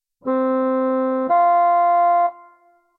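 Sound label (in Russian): орган интервал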